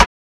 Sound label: Rim